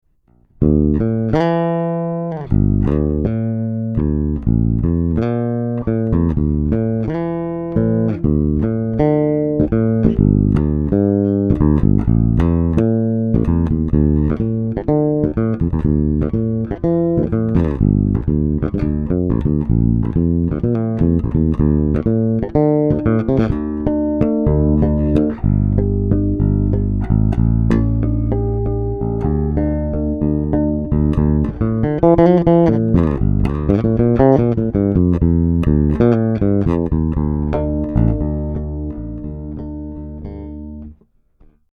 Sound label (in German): Sadowsky NYC5 passiv steg pickup VTC halb